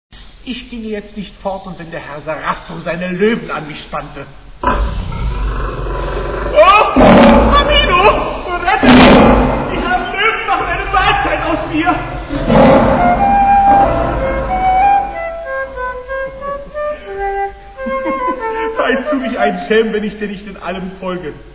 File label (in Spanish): flauta; FLAUTA MÁGICA